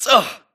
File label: grunt hits; Titanfall 2